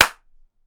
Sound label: Clapping Hands Slap Single Clap Close Up; 96khz; Mono; Zoomh4n Nt5